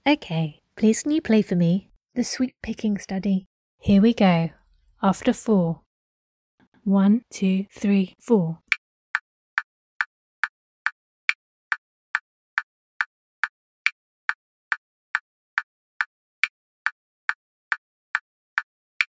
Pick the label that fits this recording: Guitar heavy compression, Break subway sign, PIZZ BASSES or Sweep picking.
Sweep picking